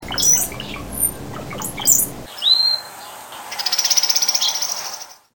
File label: cowbird; Mono